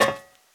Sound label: fire escape; blindside